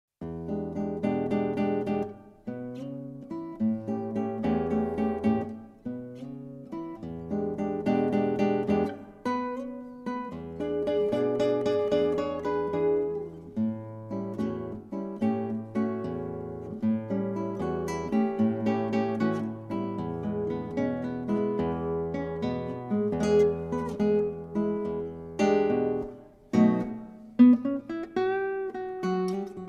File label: blues